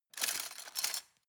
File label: cutlery plastic drawer grab hard